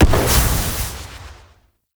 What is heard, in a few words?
Prisoner Explosion; Gameplay; Mission Four; Sentient Narmer; Warframe; The New War